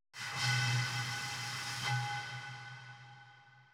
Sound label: bottle, ethno, 125